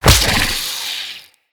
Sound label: Infested Femur Should Fire